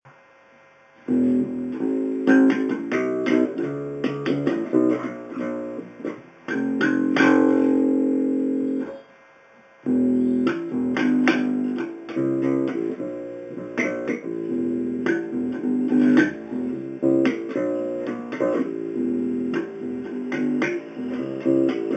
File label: bass testing; after fixing some loose wires